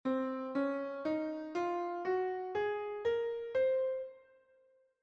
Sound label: Lochrian, Mode, bells